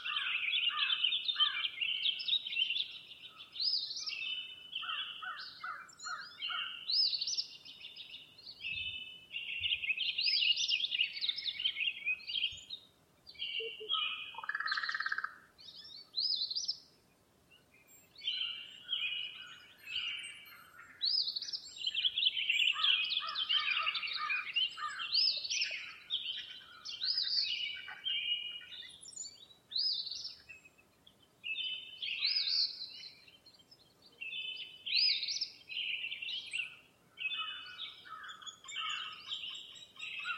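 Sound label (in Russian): многоголосое звучное пение птиц и кваканье лягушек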